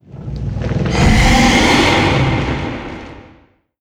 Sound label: HOLY STONE KEEPER war attack